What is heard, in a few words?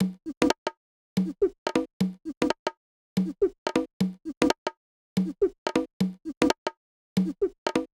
Loop 120, Perc Loops; Equipped Music; Loungin House